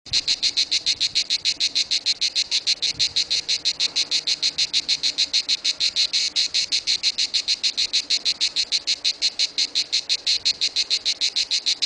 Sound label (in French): CHANT DES CIGALES